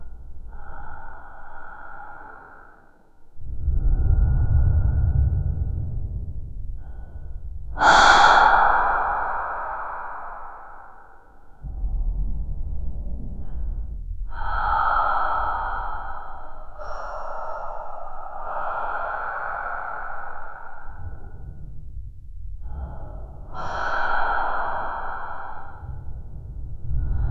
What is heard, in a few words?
female breathing slowly